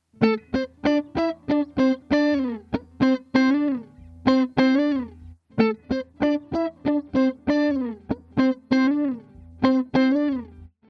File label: phaser; orinj